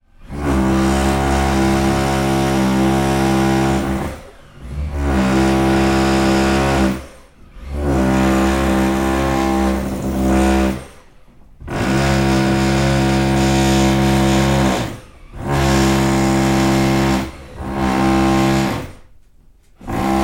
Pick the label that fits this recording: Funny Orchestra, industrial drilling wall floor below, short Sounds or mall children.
industrial drilling wall floor below